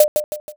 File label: piece move